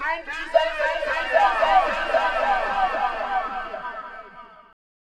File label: Vox, 075 Dmin